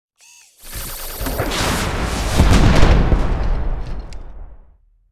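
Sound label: Sound Monster Bio Assault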